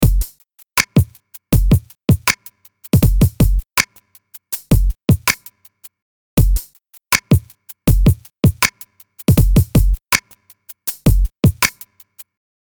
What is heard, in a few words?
Dry